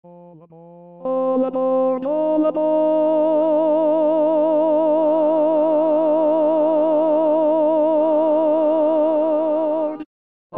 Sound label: tenor